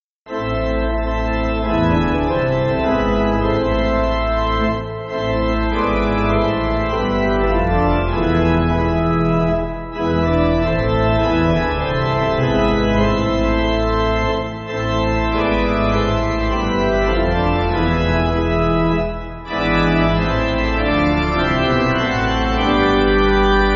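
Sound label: Pipe Organ, church backing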